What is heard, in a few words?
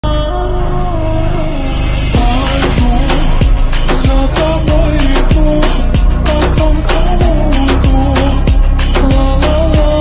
slowed